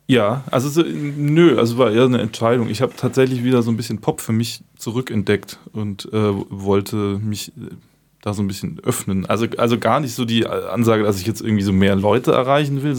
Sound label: Pop